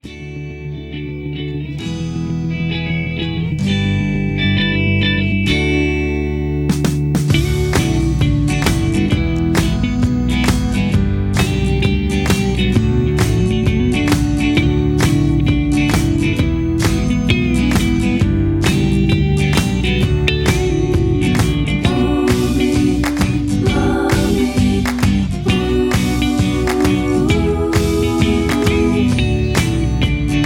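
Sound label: Instrumental with Leads with background vocals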